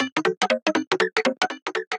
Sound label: Synth Rhythmic; Railings And Pipes